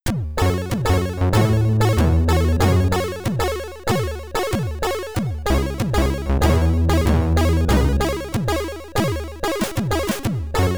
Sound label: Continue, SID Stereo; Navy Seals; Commodore 64